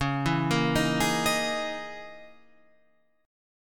Db Minor 6th Add 9th Db down Guitar Open F; Dbm6add9 arpeggiated; JGuitar

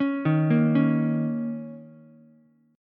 Bb Minor Double Flat 5th down Ukulele, chord; Bbmbb5; arpeggiated; JGuitar

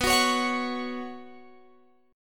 B Suspended 2nd B Guitar Open F; Bsus2; strummed; x,18,18,20,21,20

